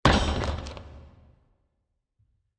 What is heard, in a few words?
Metal Pole Impact; Bursts; Impacts; Sound Effects:Impacts; CraftedSignals